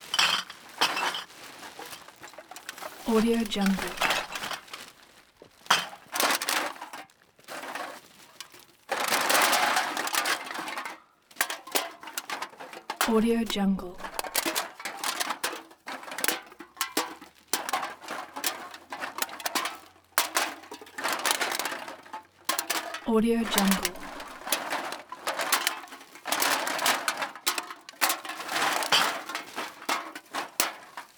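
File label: effects exterior cans into bag with truck; collanderson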